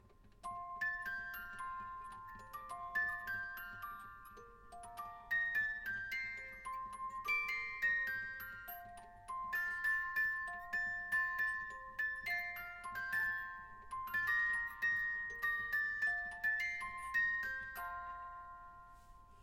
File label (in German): Jingle Bells schnell